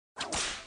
Whip Sound

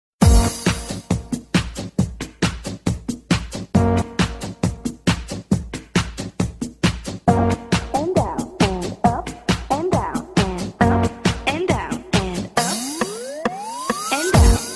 up down beat

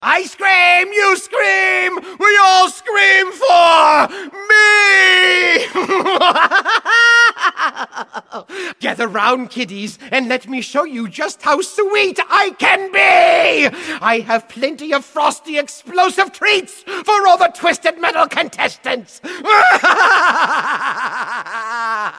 laugh; sweet tooths

